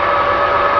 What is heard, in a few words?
Sr1 run; finnish